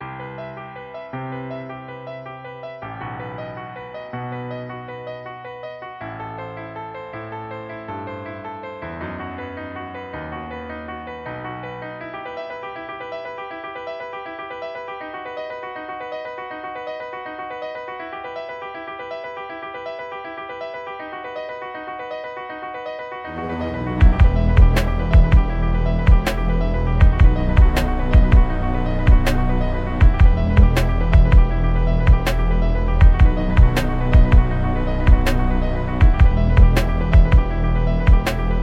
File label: epic piano